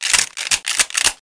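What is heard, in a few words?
pickup, weapons; SINDEMO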